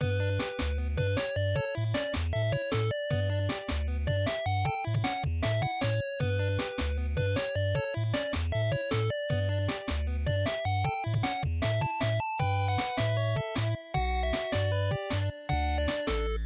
Sports Menu Loop

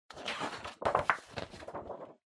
Calendar Flip

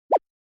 Anime Motion Low

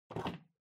Wall Clock Hook Remove

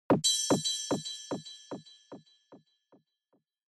Onoma Surprise High Delay

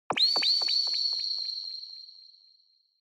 Onoma Flash (High Delay); オノマトペ 光る; 高 ディレイ

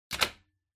Wall Outlet 2 Pin Plug In Hard

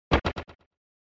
SNES Action Door

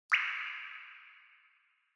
Water Drop High Reverb

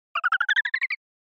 Motion Pop

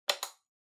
Megaphone Hardware Trigger Switch

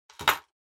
Pocket Watch Put; 懐中時計

